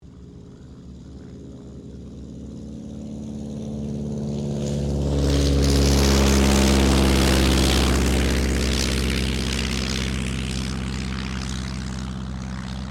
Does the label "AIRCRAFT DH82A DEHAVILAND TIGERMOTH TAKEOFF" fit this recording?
Yes